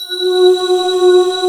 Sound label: D70 mega pad; East West; Global Sounds